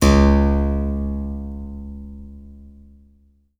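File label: HARPSICHORD STOP D#2; Best Service Advanced Media Trax Modern Composer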